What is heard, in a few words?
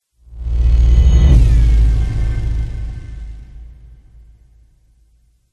spaceship fly by; george gazetis